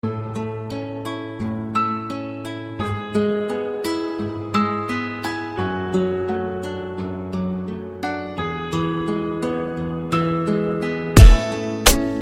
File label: best ringtone